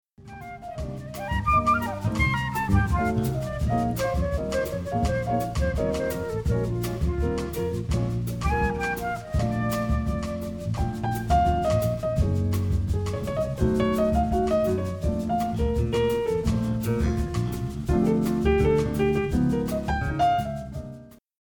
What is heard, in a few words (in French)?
extrait bossa